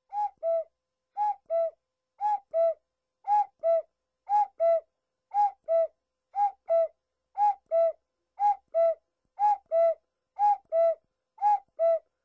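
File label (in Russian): часы кукушка; Chasy kukushka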